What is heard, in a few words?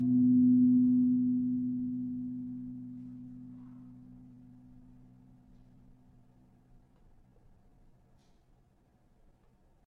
Gong 4C, gamelan tuned; gravityharps